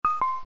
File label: bip bop